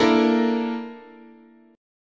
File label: Bb Major 7th Flat 5th, chord, guitar